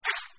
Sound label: serve food to standing customer; Delicious Emilys Wonder Wedding